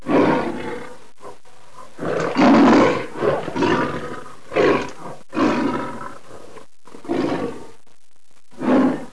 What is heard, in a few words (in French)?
lion cri